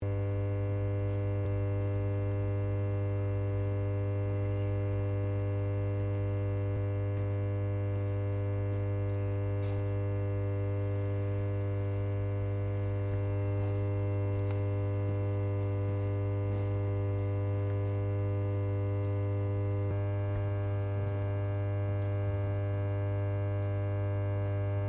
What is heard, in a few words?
SHIP ELECTRICAL HUM LOOP; Machinery; HMAS VAMPIRE